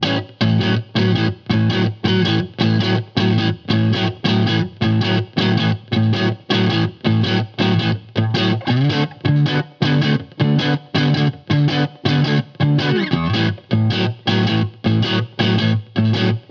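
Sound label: Blues Guitar; Pro Tools Essential Loops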